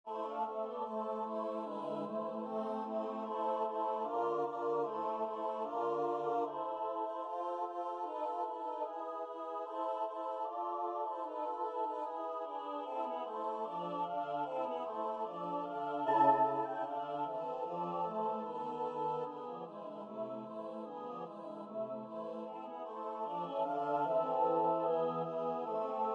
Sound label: midi; schumann; doboszka tamburinschlagerin